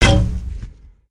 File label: Banana Gun Fire